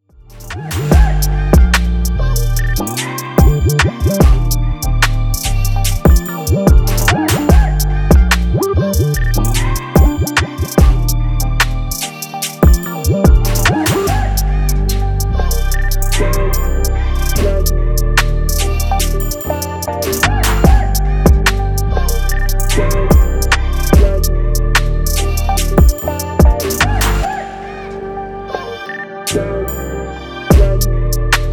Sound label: Funk